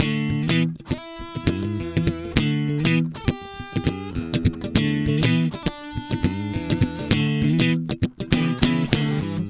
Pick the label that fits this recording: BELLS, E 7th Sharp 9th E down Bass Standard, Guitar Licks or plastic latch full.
Guitar Licks